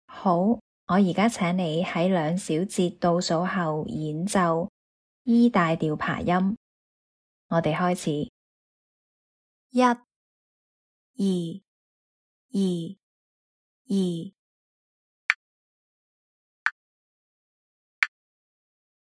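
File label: E major arpeggio B flat major arpeggio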